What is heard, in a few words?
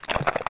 Shell Blade Attack; zelda; OOT